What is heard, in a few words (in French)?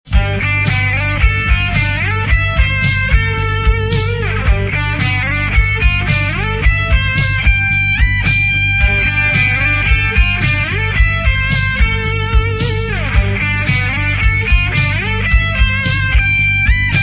plus d1 octave